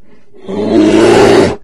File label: bear attack; animalsvotive; Minecraft; Votive Content